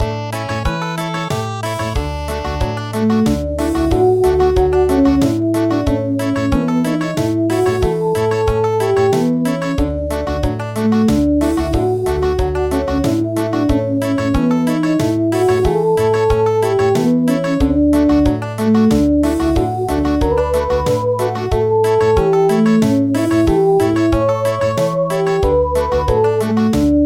Country; Abschied Von Den Bergen

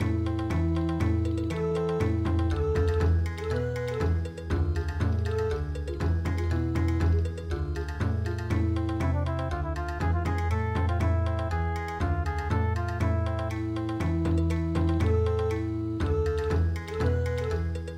Bon Odori

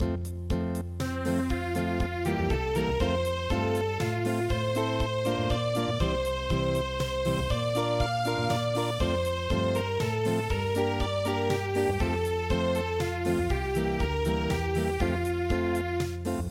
Acoustic; Es war einmal ein kleiner Mann

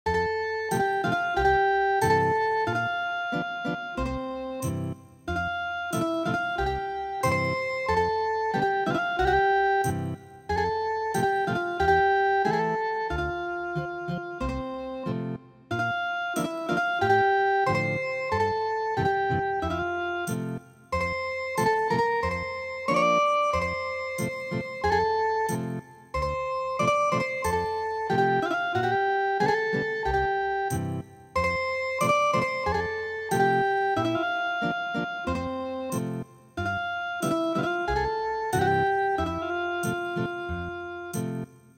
Ethnic Guitar, traditional

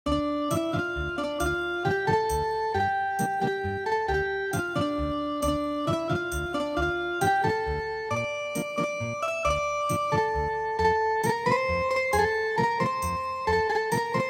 Ethnic Guitar, traditional

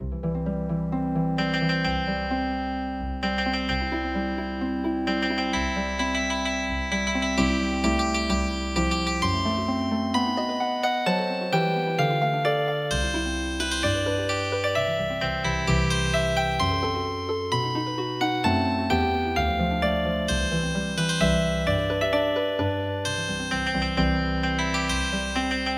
Healing Harp, midi traditional; Wedding march

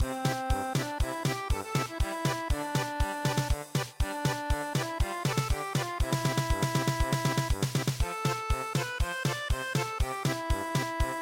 German Polka

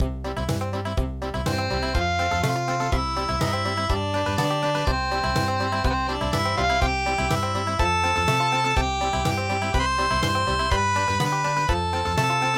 The Carrion Crow Country

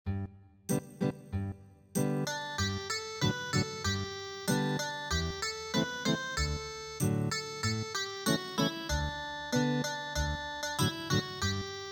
Ethnic Guitar, traditional

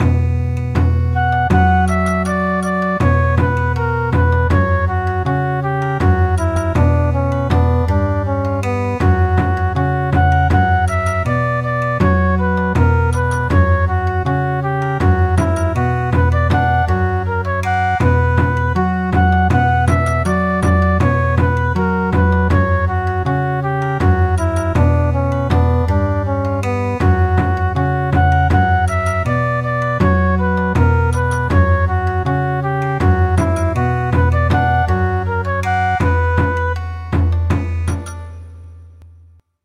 Bon Odori, traditional; The Little Bell

